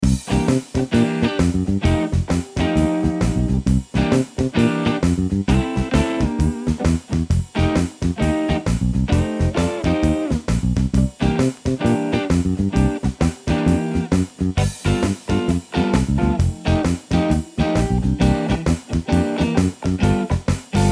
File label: Blues Licks Backing Track Full Loop; 30 Must Have Blues Licks